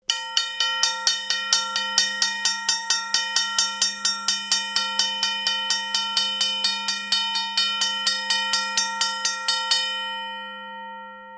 Railroad Crossing Bell; Smaller Bell Rings, Quick, High-pitched, Constant To End, Ringing Out.